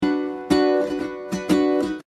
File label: instrument nukulele rhythm